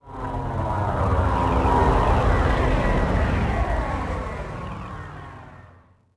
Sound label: traffic By; Star Wars Obi Wan; XBOX